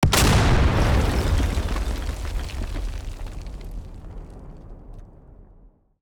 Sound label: dynamite; Explosives Explosions; civ13; civ14; Weapons